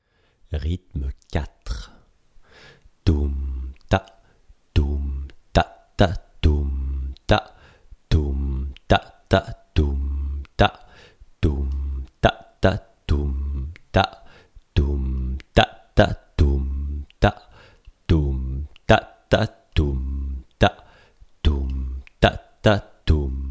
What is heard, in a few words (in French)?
rythme